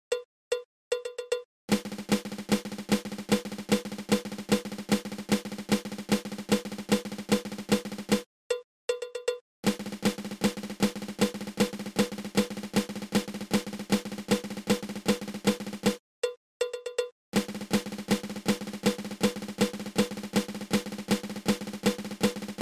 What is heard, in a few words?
Flam Drag